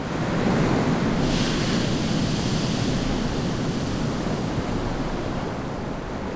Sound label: Water waves Loop; 1944 Battle Of The Bulge